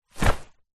wetsuit top drop ground

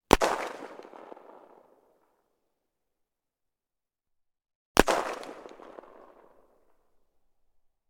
gun arisaka; still north media

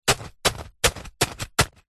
foley stones small wood batton stabs

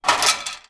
foley metal small object thin down; zapsplat

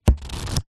household book flick through pages

